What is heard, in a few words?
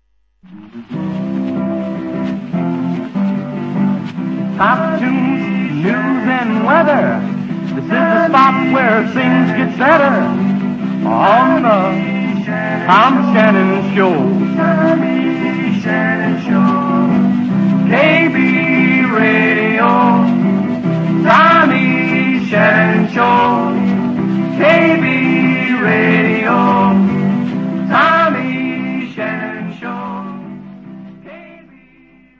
trio show jingle; tom shannon show